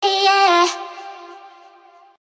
eeeyah female, voice; ooh ahh

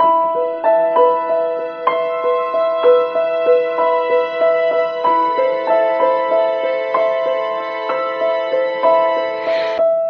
piano, Sad Loops

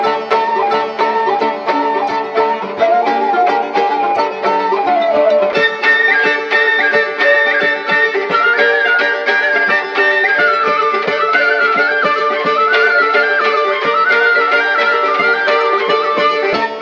flute, Jazzmatazz Loops

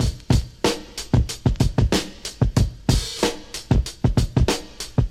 synthetic subs, Boombap Drum loops

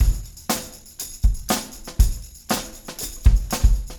motown, funky drum loops

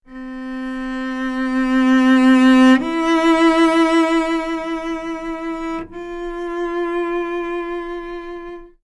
strings, Loops, Sad

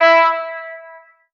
honk, Horns; Notes

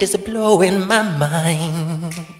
is blowing my mind SUNG

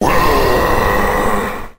growl, Voice; ooh ahh

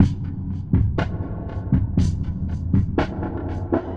gulp

